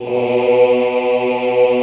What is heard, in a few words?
OHH B1, Classical Choir; Best Service; Extended Classical Choir